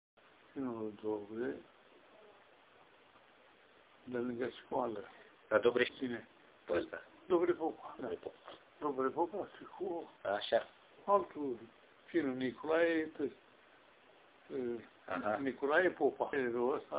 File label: Trap